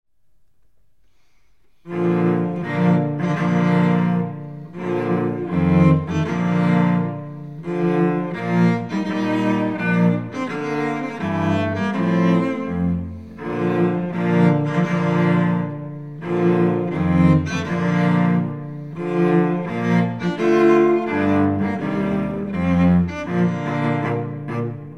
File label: Cello Duo wedding march